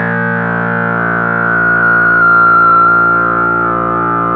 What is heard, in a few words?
ARP 2600 A#2, synthesizer; Hollywood Edge - Giorgio Moroder Rare Synthesizer Collection